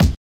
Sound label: Jungle Kick